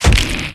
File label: Melee Magical Medium; Kilta